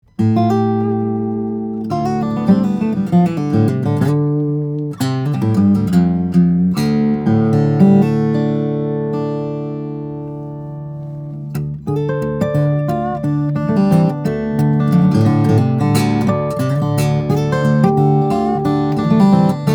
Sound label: Martin 000-42 Cutaway, guitars; madagascar rosewood; italian alpine spruce